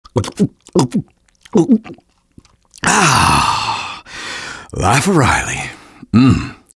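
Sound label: engineer guzzle; vo; taunts; tf2; engy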